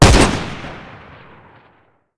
rifle fire incendiary, gunfire; weapons; rifle desert

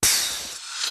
turn on, flamethrower; halo; weapons

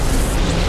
onager charge

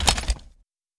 pistol deploy; m6h2

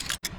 zoom first

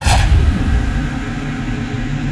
ban start

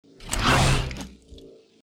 melee

